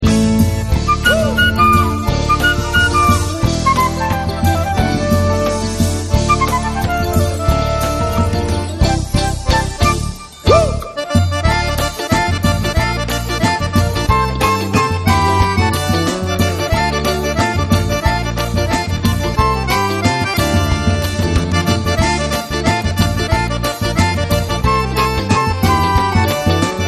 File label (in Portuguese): forro